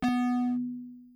zap; Kenney's Sound Pack; Digital Audio

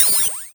door Open; Kenney's Sound Pack; Sci-Fi Sounds

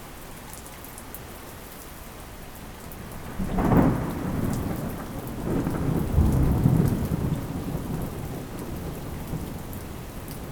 distant thunder; 99Sounds; Rain And Thunder

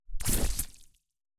Web Slinger Shoots; Super Heroes Sound Design

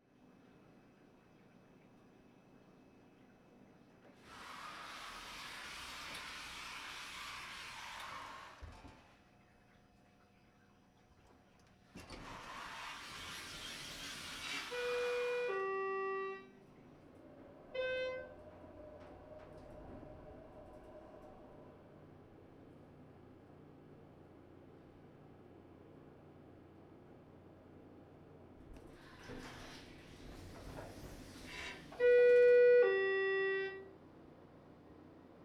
INT Hotel Elevator Sequence, AMBISONICS; Coll Anderson

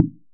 impact Wood medium; Kenney's Sound Pack; Impact Sounds

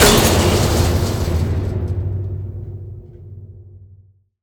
metal cinematic impact

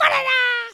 voice fun character cute cartoon; Gamemaster Audio; Fun Character Voices

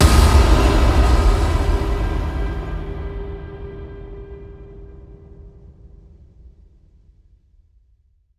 Iron Sky, Cinematic Impacts; 99Sounds; Rumore

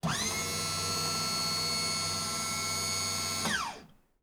Electric Lift; 99Sounds; The Warehouse

